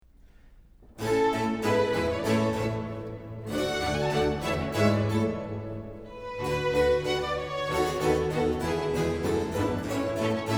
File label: Coro; Selig Sind Die Gottes Wort Hören